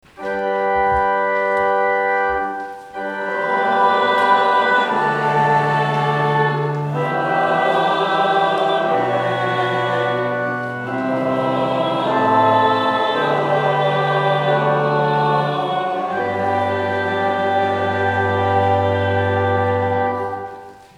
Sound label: Amen